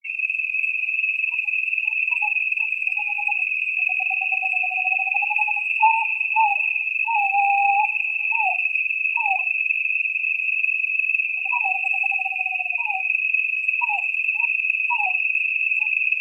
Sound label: Nighttime small, zion park; NPS